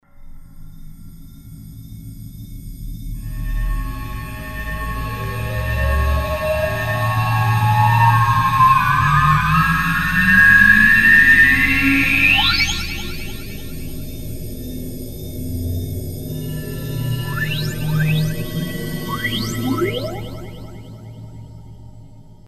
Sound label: pad resonant bell, synth roland jd800; AMBIENT: resonant bell curve